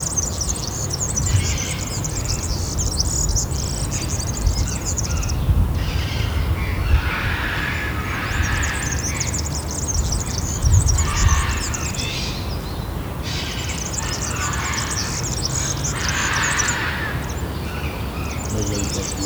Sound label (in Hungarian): másállatok is villanypásztor, mezei pacsirta; debreceni zoo 2012; marantz puska